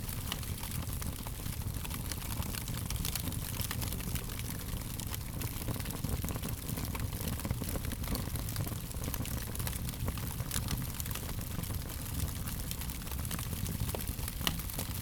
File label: Fire Crackling